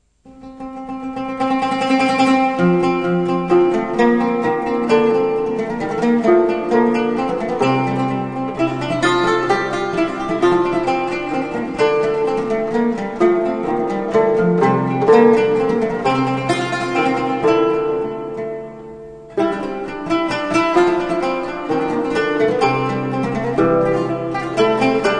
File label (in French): luth; instruments medievaux